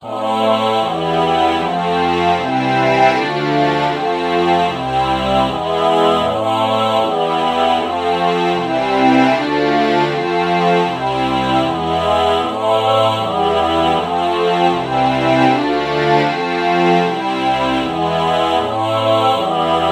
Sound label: ah violin true chord morph